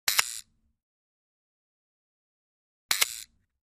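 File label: Camera Manual Snap Long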